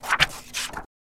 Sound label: Paper Falls Motion; paper mvmt